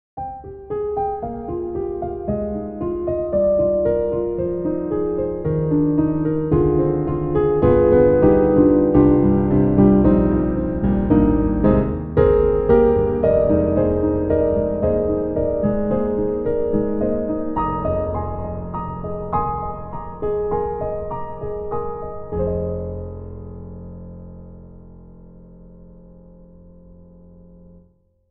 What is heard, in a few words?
Grand Pianos, Bosendorfer, Soft Hammers and Dampers; Synthogy; IvoryII